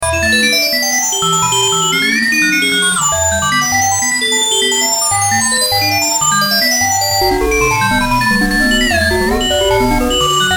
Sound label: Slot Machine Synth